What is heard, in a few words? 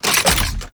Flak Cannon Reload; UT Weapons